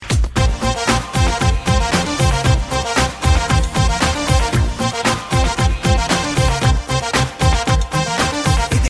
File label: telugu music ringtone